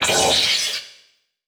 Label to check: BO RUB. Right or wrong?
Wrong